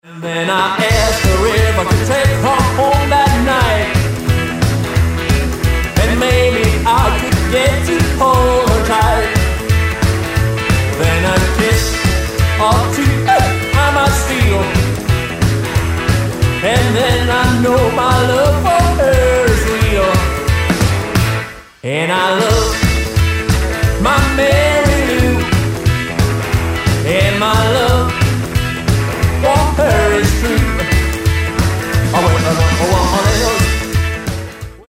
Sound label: Rockabilly Party